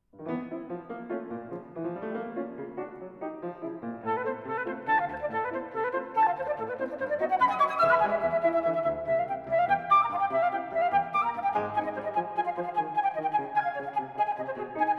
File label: Flute Sonata in D; Sergei Prokofiev; Movement 2; II. Scherzo